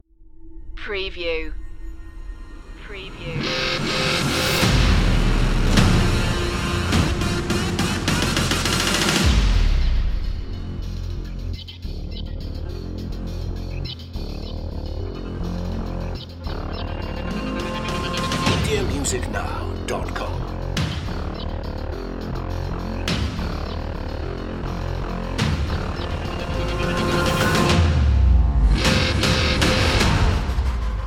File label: energy no choir